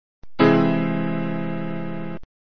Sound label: augmented triad